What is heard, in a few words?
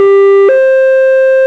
australia firetruck siren; gmod; svmod